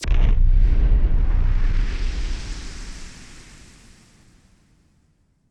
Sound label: Noise Bomb Rumbler; musicradar; bomb factory